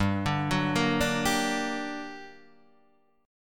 G-Minor Major 7th, GmM7 chord; arpeggiated; 3,5,4,3,3,3; JGuitar

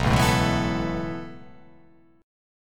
B 7th Suspended 2nd Suspended 4th down, chord

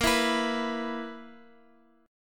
B Major 7th Suspended 4th Bb

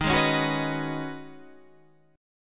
C sharp 7th Suspended 2nd Suspended 4th D sharp

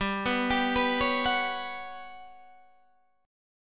G Major 7th Flat 5th G down Guitar Drop D

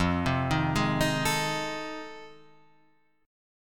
F 7th Sharp 9th

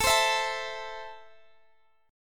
A Minor, Guitar, DADGAD

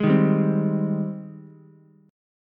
Ab Major 7th Ab, Ukulele; AbM7 strummed; JGuitar

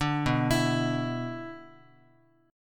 B Minor Double Flat 5th B Guitar, chord; Bmbb5 arpeggiated; JGuitar

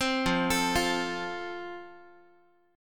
F Major, Guitar; arpeggiated; 15,13,12,15,x,x

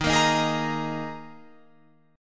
Eb Suspended 4th, Guitar, Open F; strummed; 15,17,15,15,18,17

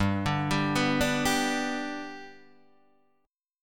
G Major, Guitar, Half Step Down

